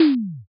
impact text

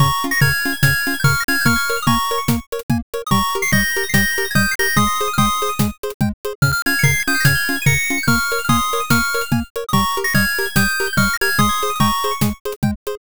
bgm bright

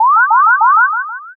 scan

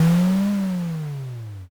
lion roar

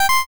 pico hello

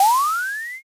vanish

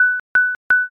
timer done

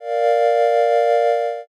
strings

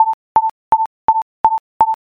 railroad

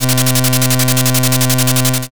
slot spin

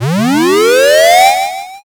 charge

